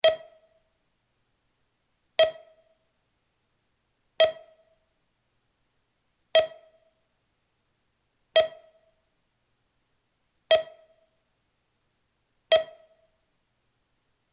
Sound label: Lost Computer - Initial Beeping; Underground Caves